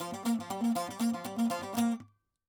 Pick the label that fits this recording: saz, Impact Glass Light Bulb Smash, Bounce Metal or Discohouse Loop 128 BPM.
saz